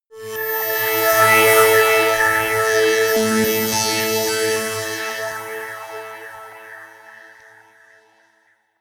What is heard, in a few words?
sci-fi new galaxy, drones